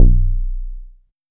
SAUSAGE BASS, Bass Analog & Synth; USB Soundscan vol.12; AKAI

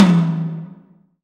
TOM TOM, Roland

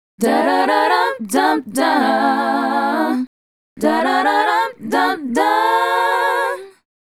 Female Group, Vocal

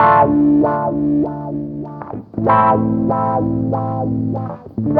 WAH; USB Soundscan vol.31; Miro s Grooves; AKAI

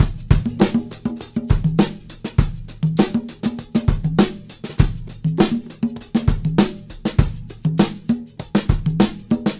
Combo, R&B Drums; Sonic Foundry (Sony Creative Software) - R&B Drums

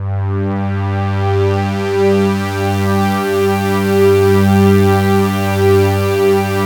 analog swell; Sound Gallery - Charged

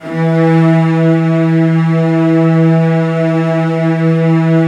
CELLOS F#3, Strings; ILIO

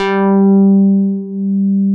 MOOG1 BASS G3

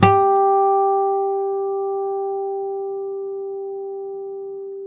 STEEL HARM G, GUITARS; Synclavier Sampler Library

